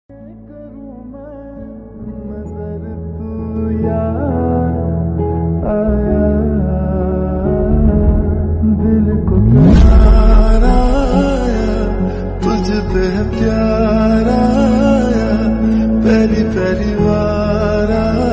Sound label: Slowed Reverb Ringtone